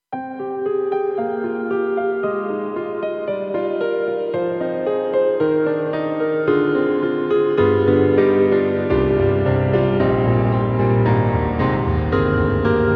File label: KORG M3 Cinematic Piano; M3 Version 1 Wave